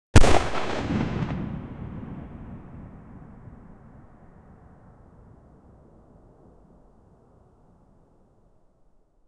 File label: a 152mm howitzer shot, artillery